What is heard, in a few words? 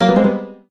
8bit piano hurt